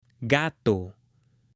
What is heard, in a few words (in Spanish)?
GATO; ANIMALES